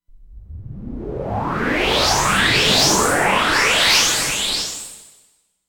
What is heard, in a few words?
Sweep Energy; Yamaha SMAF demo ringtones; SMAF Entertainment